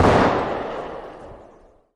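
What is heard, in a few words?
usp unsilenced distant, gun; arccw go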